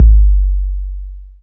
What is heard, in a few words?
phat steel kick drum sample g key